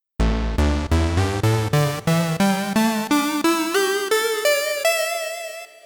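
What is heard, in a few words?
lead funny chord; the transmitter soundset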